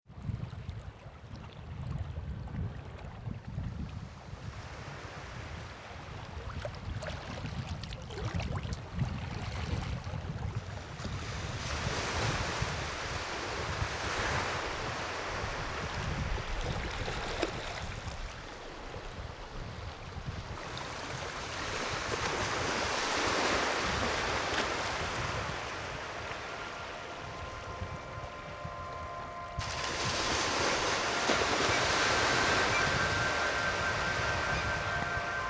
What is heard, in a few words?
twinkle reverb ocean